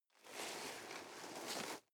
nylon leather soft

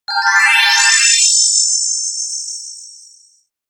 move pitched glissening

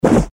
fight kung fu swish

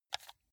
console controller arrow key press fast

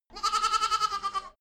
goat lamb baa close

